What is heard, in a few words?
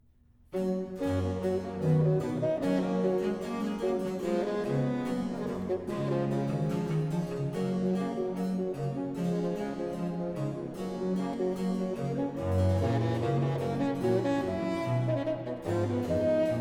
Aria; Man singet mit Freuden vom Sieg, BWV 149; Seid wachsam, ihr heiligen Wachter (Alto, Tenor